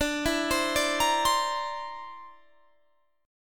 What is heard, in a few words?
D 7th Suspended 2nd Sharp 5th D